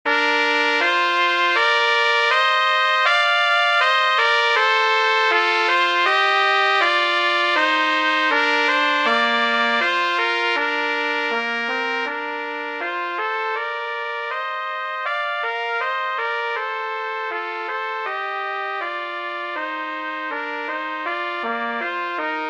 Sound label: air major; haendel georg friedrich